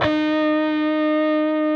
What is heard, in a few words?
PRS E, Guitars, LEAD